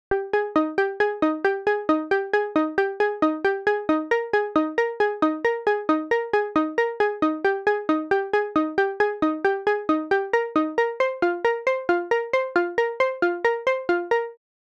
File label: Arp Mini V